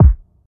nice bass drum single shot b key, Royality free steel kick drum sample tuned to the B note